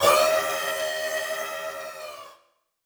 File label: Title Transition Descend; Production Element Title Transition